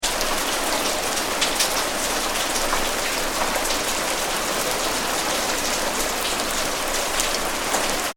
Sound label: weather rain med drips street, Rain (Medium) With Dripping; Rain ( Medium ) With Dripping On Hard Surface; McKinney Sound